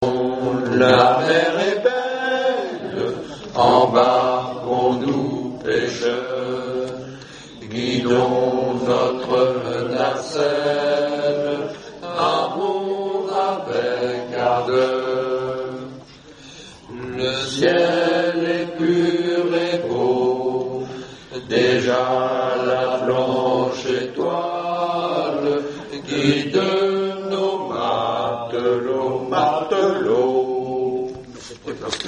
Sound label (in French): refrain hommes; Partons la mer est belle